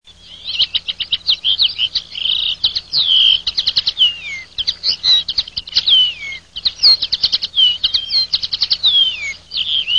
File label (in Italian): calandra